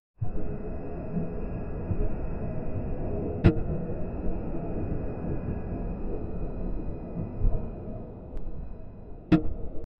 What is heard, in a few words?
creepy and trippy ambienc